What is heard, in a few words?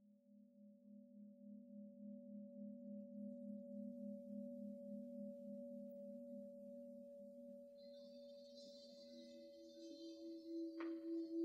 SOUND BATH